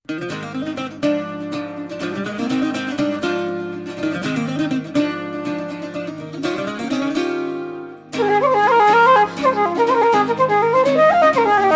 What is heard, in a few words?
Reels, bodhran